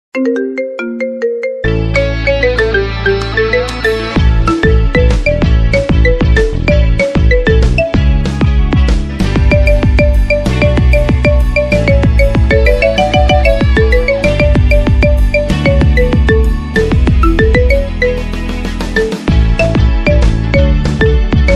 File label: Marimba Remix Marimba Ringtone; Friends Theme